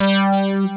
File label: PEGGIATE, DANCE; Pro-Rec - Synthsonic; Z1 DANCE